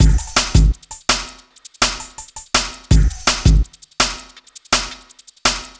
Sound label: Beat, Futurist Drum & Bass; Drums & Percussion